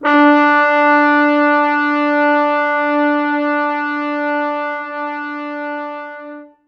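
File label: French horns soft mutes, four, D3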